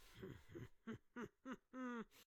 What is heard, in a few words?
Attack Vocals; Blackbeard